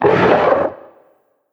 HUSKEDCRAWLER idle

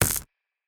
Taser Shot

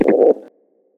take Damage; PEANUT

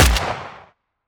WEAPONS assault Rifle

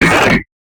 HUSK death

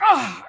Male damage

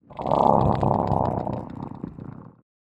MUDRAPTOR pet Happy

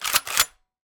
Shotgun Load

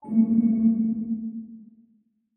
Sonar Ping Far

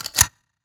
rifle Reload

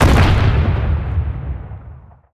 Railgun

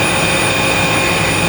WEAPONS charge Up

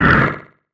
HUSK idle

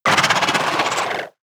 HAMMERHEAD death

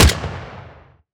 rifle Shot

